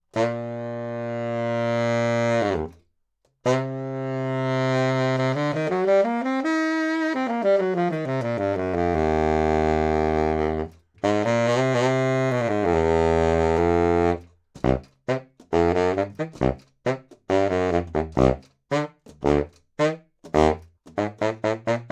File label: Sax Baritone 20cm Low